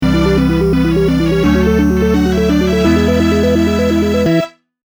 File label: Key Item Get! (32-bit); Lenna's Inception